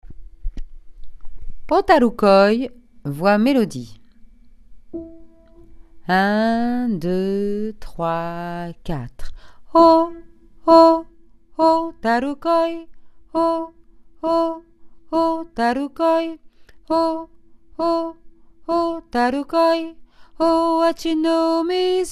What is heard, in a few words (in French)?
HOTARU KOI, Voix Accompagnement Voix Mélodie Deux Voix